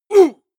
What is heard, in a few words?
male combat hit soft; schreie; menschen; soundpool